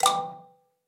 Correct

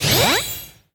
Multiball Build; disney star smash android ios mobile gamerip 2020

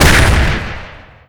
Fistball Finish

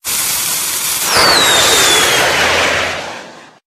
rocket full

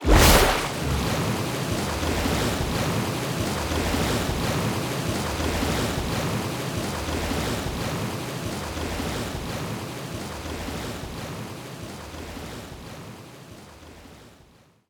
Tidalwave Generate

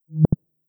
Error

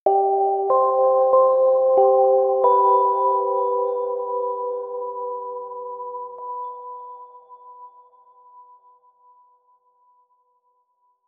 Puremelody 95bpm 44 G4 Phrase; Planet of Lana

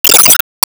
Enemy Flame Skill Shot

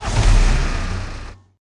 Cruiser Engine Start; Lethal Company

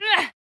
Overhead Swing; Jackie

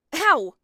anti ow

